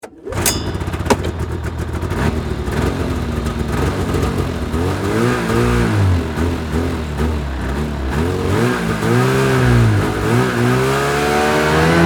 Snowmobile Away, Sports, Snowmobile, Start, Idle, Pull, Away, Yamaha, Exciter, 440; Miscellaneous Sport